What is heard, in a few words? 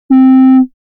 Clarinette; instruments